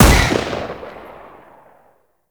Heavy Pistol Shot Alt B; Warframe; Weapons; Zariman Heavy Pistol

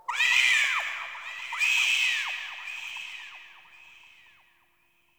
Texture Bird Small; Ambience; Misc Outdoor; Warframe; Infested Eris

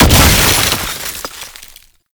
Snow Globe Burst; Warframe; Nineteeen Ninety Nine; Deep Archimedia

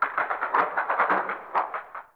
Venus Landscape Glacier Ice Distant Small; Warframe; Venus Open World; Ambience

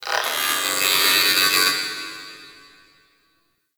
Robotic Chatter; Guild; Warframe; Misc Remaster

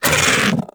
Bug Vocal Pain; Characters; Warframe; Hunting Critters; InfKDriveBug

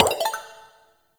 Messenger Window Open; Warframe; Ambience; Nineteen Ninety Nine

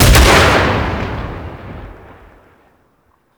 Sniper Shot; Warframe; Grineer; Kahl

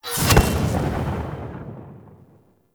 Stag Ooze Ground Stab; Characters; Enemy; Lady Deora; Abilities

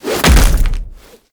Finisher Tenno Landing; Warframe; Venus; Orb Heist; Cinematics

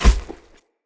Step Vegetation, Footsteps; Enemy; Characters; Mendicant; Mnd King

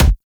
Weapon Punch Shotgun; Warframe; Weapons

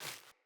Sandals Run Vegetation, Footsteps

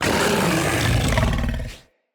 Kubrow Feral Vocal Angry

